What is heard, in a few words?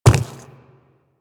Quadruped Medium Walk Default, Footsteps